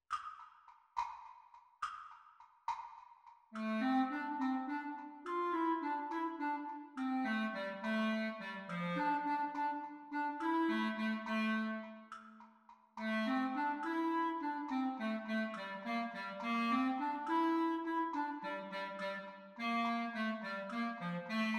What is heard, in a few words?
Practice speed Recording Clarinet Duets; Grade 7